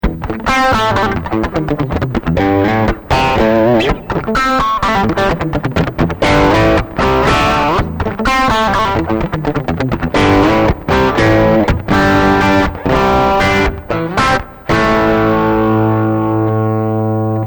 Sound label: Penta Meets Chroma